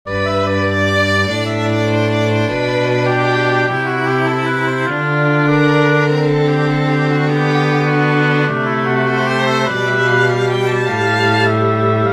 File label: double canon retrograde inversion